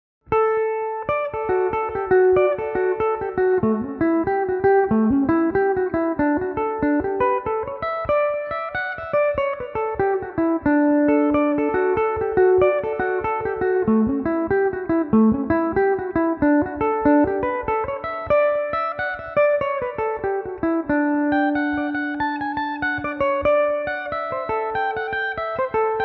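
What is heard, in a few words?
jig D major belmuse, irish mandolin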